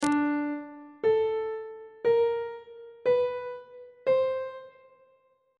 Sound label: c double sharp dim6 min6 maj6 aug6 intervals on treble clef